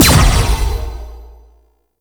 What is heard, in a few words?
Player Weapon Projectile Parry; Warframe; Duviri; Dax